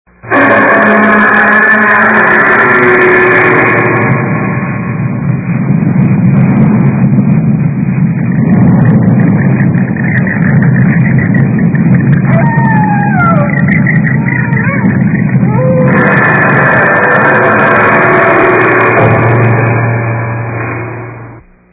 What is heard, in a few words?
Haunted House; At the sound of the beep